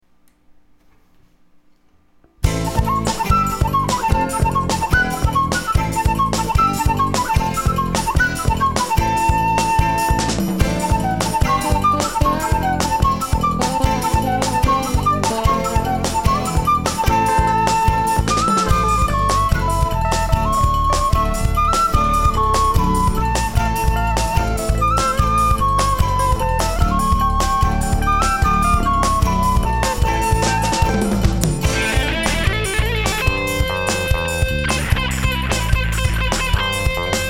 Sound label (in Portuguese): orquestra